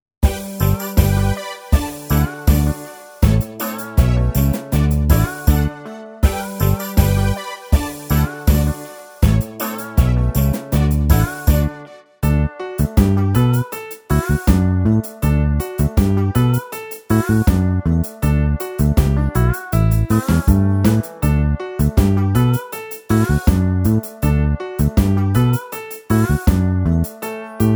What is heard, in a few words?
Karaoke